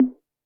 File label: 808 kit hi tom